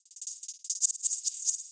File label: hh roll fx; goodtakimu drumkit; fl studio; drumkits